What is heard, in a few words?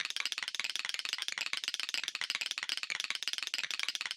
Motion Tremble Loop